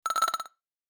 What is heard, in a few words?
scratch tone